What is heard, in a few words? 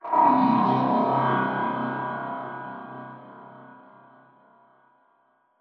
strider distant; Half Life Alyx; combine; c17